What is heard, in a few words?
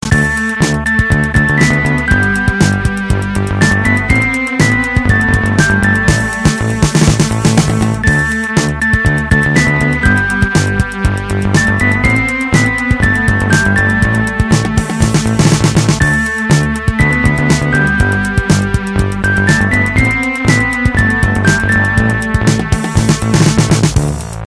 Ringing